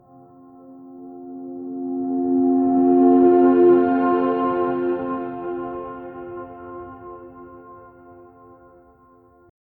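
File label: heaven shining bright echo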